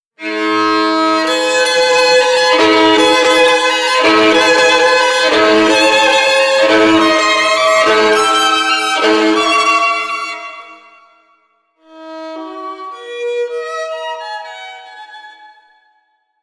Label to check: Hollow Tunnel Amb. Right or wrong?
Wrong